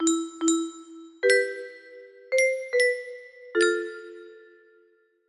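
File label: slick loop, music box melody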